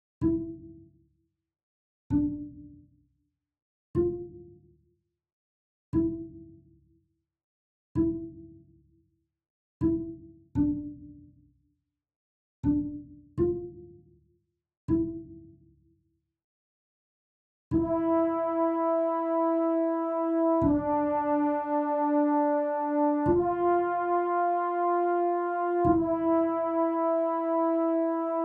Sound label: A sad song; the heros passing